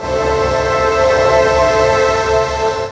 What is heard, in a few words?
Hair Port A, Pads; Zero-G; Total Drum Bass